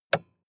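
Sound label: sports car interior switch on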